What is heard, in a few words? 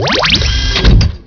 pickup